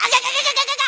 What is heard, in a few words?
Shock; Link getting shocked